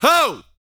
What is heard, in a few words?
VOCAL, R&B Dance, HIP-HOP